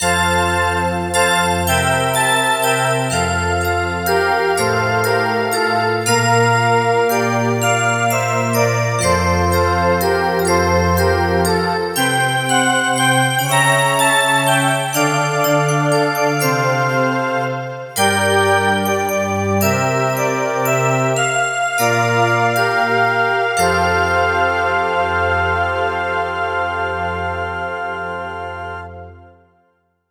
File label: x-mas bell pad